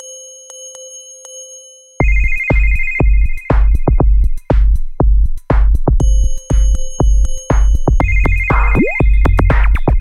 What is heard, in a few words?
Bell, ringtones; Coolpad; Dazen-X7